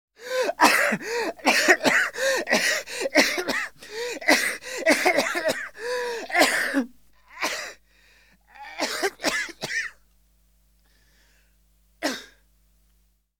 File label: Man Coughing; KMP Film Video Sound Effects